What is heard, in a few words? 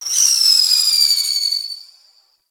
Spam Projectile Whizz; Warframe; Teralyst; Ropalolyst; Abilities